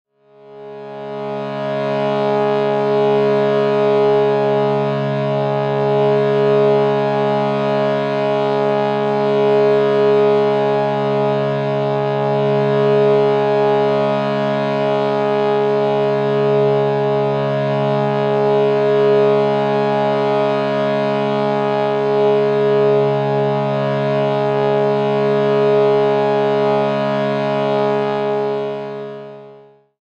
Shruti Box C# G# 440Hz

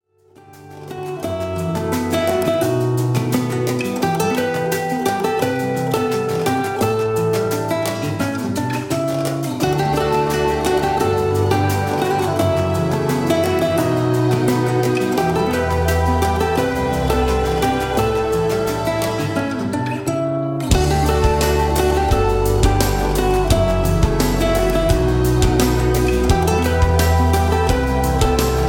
Lounge

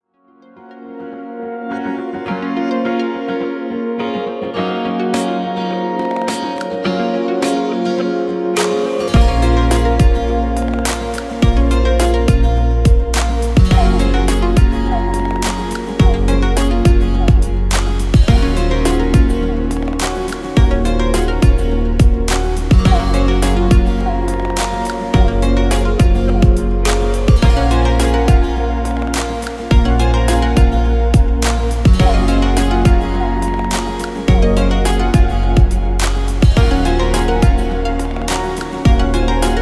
Relax Lounge

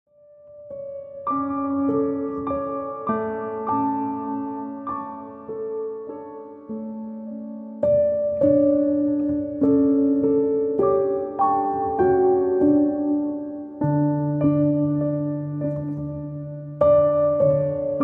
Deep Meditation Piano

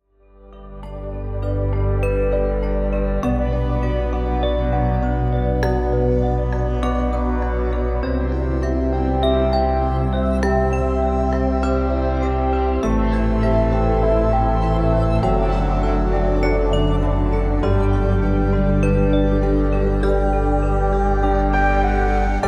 Deep Relaxation

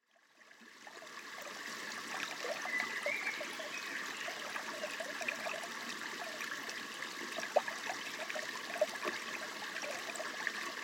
Small Creek And Birds 3D

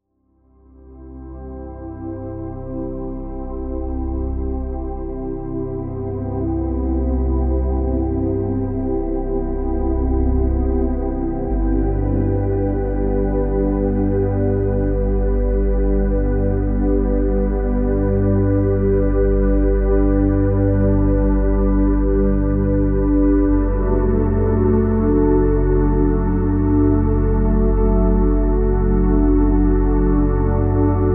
Deep Sleep Relax

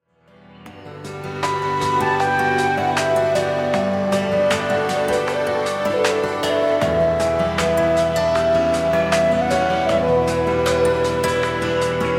Relaxing

